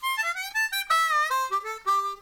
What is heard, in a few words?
Harp